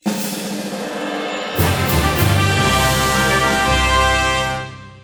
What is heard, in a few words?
Intro Fanfare